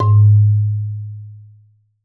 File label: Marimba G#1; Sampleheads - Dave Samuels Marimba & Vibes